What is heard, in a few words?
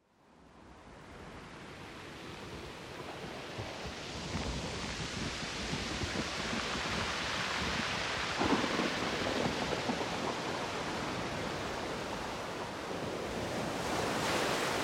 Nature Sounds - Ocean Thunderstorm